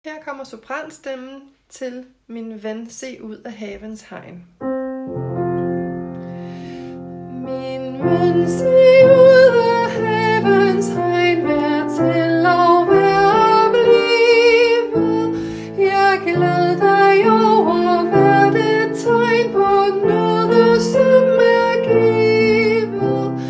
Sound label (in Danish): sopran